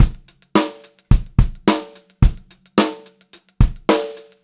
Pop-Rock Drumloops 108 POP BEAT; USB Soundscan vol.37; AKAI

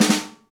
Pop Snare Ghost; Snares

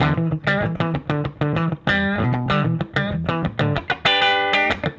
Guitar, 70s Analog Funk; Sonic Foundry (Sony Creative Software); What it Is! 70s Analog Funk

